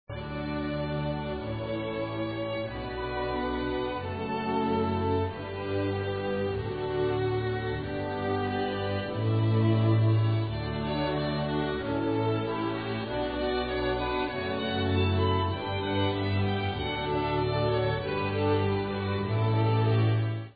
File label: canon